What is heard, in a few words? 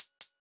nois A4; EMU Orbit-3